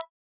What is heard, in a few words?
info button